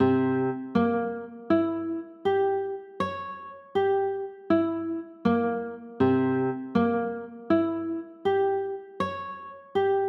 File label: c 7 b9, chords